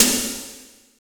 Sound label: CYBER KIT, drums